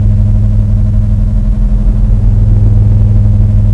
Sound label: laser